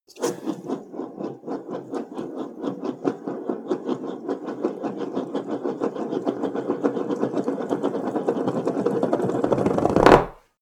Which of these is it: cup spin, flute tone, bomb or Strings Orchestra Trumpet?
cup spin